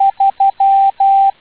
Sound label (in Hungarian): Távíró 3